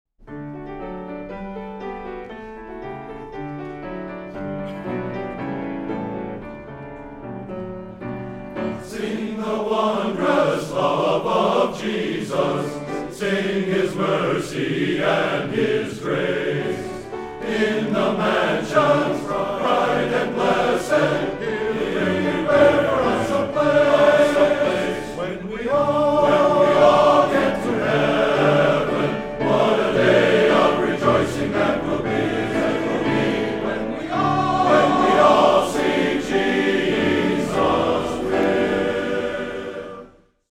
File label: Gospel Hymn Medley; Night Of Song 2007